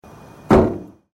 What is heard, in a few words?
Diesel Sounds Diesel Benz Trunk close, W123